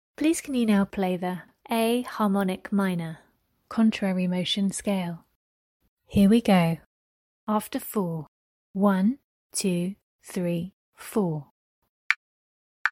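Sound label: a harmonic min contrary motion scale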